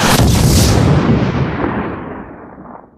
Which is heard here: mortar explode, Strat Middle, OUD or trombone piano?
mortar explode